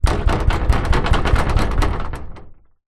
impacts hits thumps on loose metal railing pole holding glass